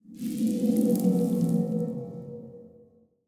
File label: no Win Respin Outro; arcane